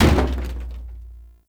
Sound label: Stadium Floor Tom Destroy; Ambience; Gameplay; Warframe; Nineteen Ninety Nine